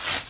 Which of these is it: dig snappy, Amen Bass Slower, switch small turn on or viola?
dig snappy